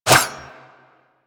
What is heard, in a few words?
Dagger Whoosh; Dual Daggers; Weapons